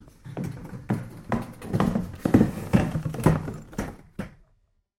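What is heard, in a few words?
luggage knocks on steps; zvuki chemodana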